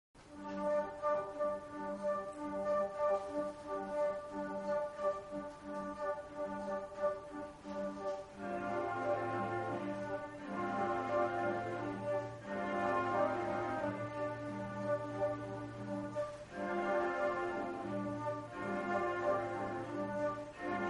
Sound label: concert, flute